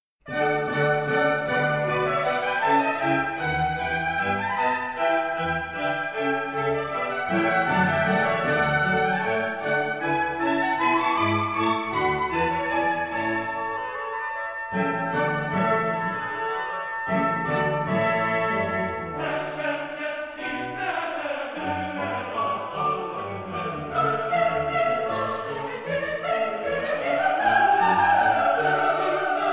Chor

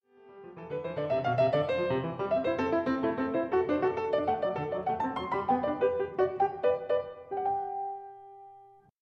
Variation fuer 2 Clav

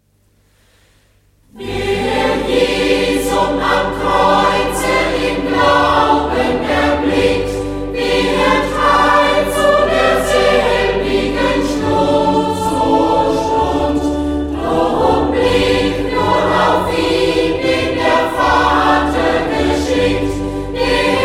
Chor

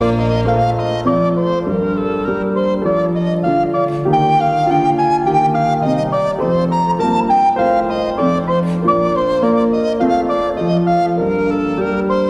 Choralvorspiel

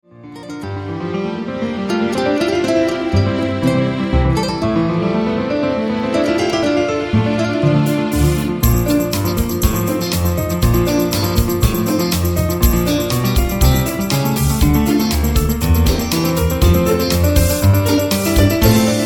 Praeludium in Bb-Dur

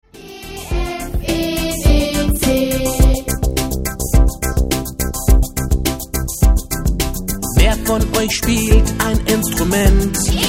Die Tonleiter

